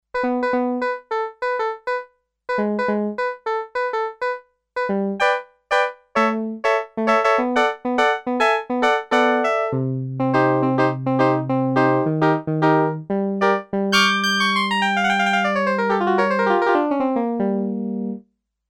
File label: Muted Clav type, Sequential Circuits Six-Trak; Factory Programs